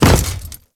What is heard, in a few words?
Step Default, Footsteps; Enemies; Warframe; Grineer